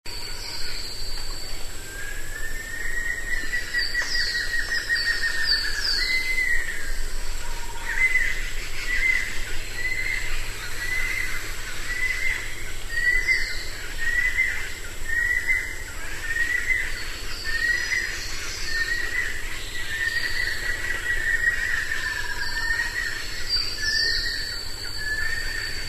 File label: banded kingfisher